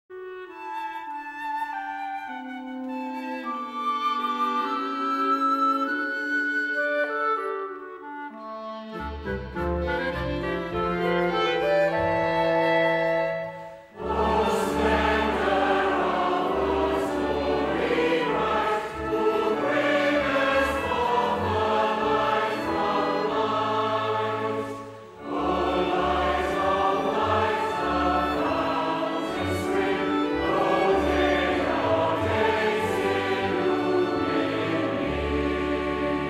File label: choir